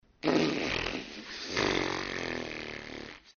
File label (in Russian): duet s bryzgami